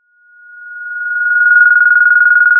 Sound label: Sound of a phone ringing